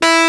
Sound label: Rhodes Fuzz E4; Mo' Phatt; Digital Sound Factory Mo' Phatt